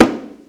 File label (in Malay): gedombak ibu cah